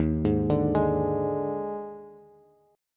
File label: Eb Major 7th Suspended 4th, Bass; arpeggiated; 11,11,12,13; EbM7sus4